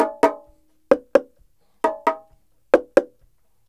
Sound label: 130 PERC7; Drumdrops In Dub VOL-1; PERC LOOPS; 130-140 BPM